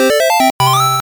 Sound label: win jingle